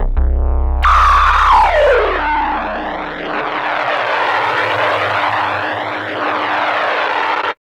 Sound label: BLURP LOW, Hard & Loud Techno; USB Soundscan vol.01; AKAI